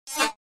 Koorotkiy prolet kak osa

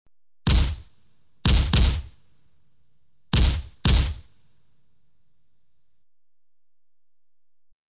Vystrel iz korotkogo ruzhya

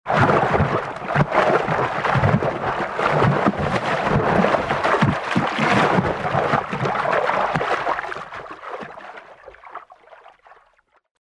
akula v vode napadenie

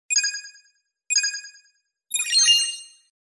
zvuk otscheta v kompjuternoj igre; Звуки обратного отсчета

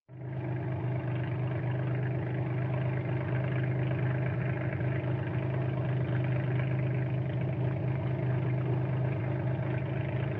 holostoj hod gazuet i ostanovka

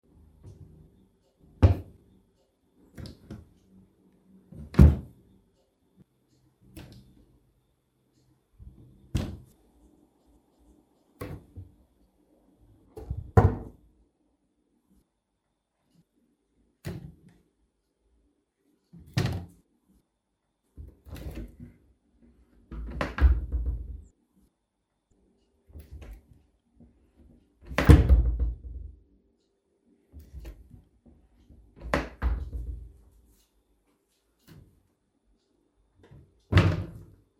kompozicija iz 9 variantov dvercy kuhonnogo garnitura iz dereva

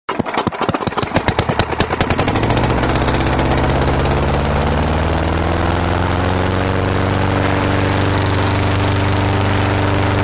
nagonjajut vozduh v lezhashhij shar

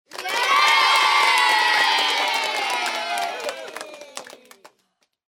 eee

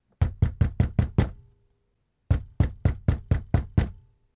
kulakom vo vhodnuju

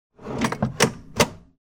zvuki otdelenija dlja deneg

